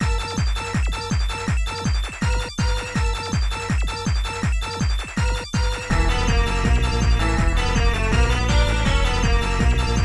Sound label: Boss Stage; Ghoul Panic; Namco System 12; arcade